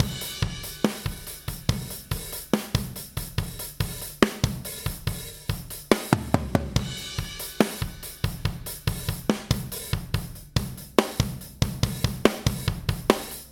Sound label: Heavy Rock Drums